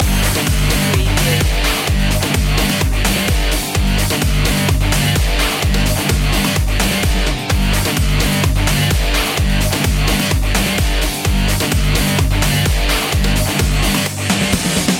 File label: rock rush loop